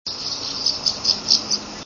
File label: swallow rough-winged